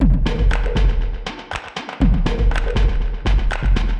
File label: 120 Beats, Drum Loops; Blissed Beats; Touch Loops; BlissedOutBeats